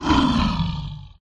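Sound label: lizard shaman wound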